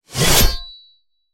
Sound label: sword strike, warrior; A succubus is born; combat